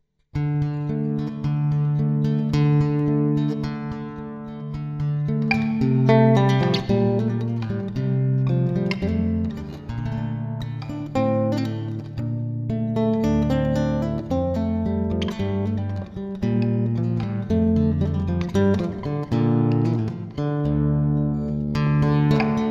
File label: newage